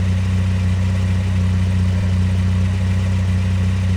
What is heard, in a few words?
car idle; Matt Hoffman's Pro BMX 2; XBOX